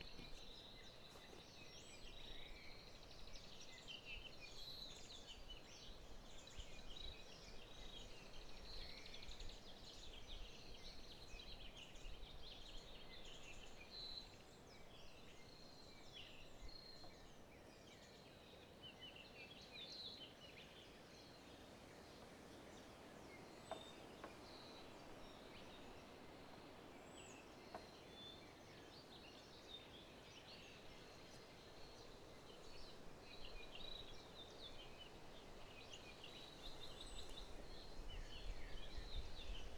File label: birdpark